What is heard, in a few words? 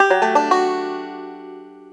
banjo arp D1; AKAI S-Series CD-ROM Sound Library VOL-2